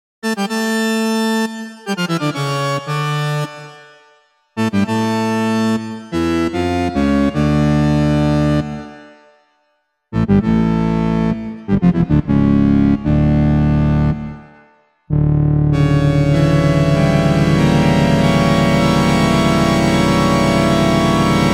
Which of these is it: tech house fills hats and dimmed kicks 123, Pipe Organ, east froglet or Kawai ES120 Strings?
Pipe Organ